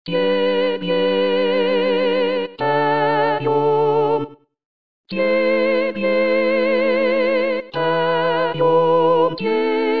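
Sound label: soprano; Tchaikovsky; tebe poem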